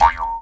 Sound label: E JAW; Big Fish Audio - Didgeridoo and Other Primitive Instruments; JAW SIMPLE